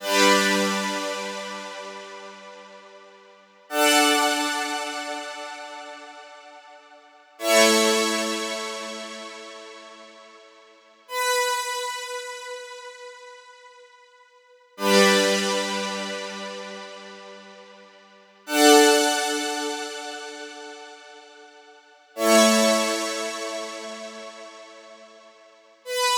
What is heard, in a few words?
Synth Chords 130bpm D; Lightning; Zenhiser